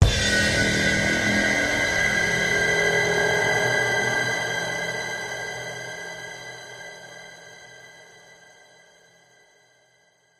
horror jump scare evil sinister dramatic shrill strings